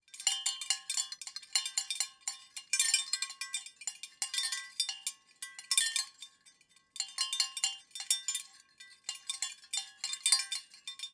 Metal Cowbells Swinging

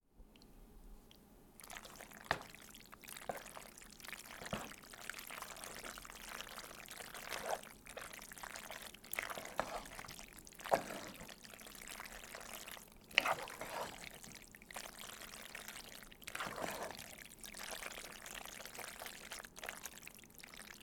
Stirring Pasta

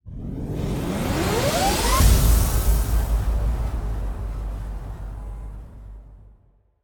Swash Cluster Hit 120 Bpm

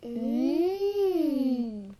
Mmms Girls

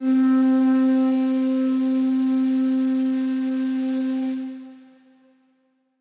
Toilet Flute C Note

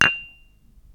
Tea Cup

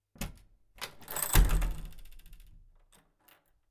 Door Opening With Security Chain

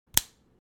Snapping Closed An Airpods Case

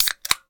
Soda Can Being Opened

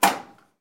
Dryer Door Opening

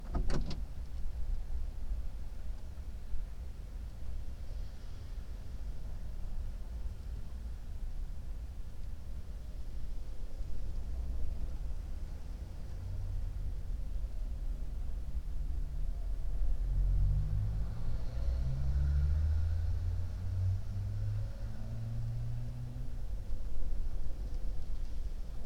Inside Car Ambience

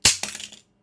Mousetrap

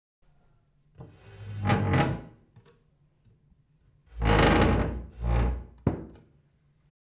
Chair Moving; Zoom

